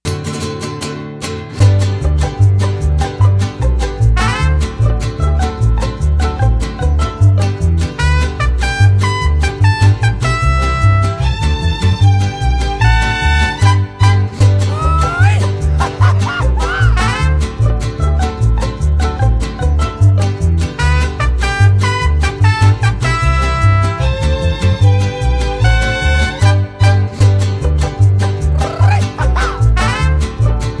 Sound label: mariachi